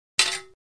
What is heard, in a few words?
Swords Broad Hits; clangs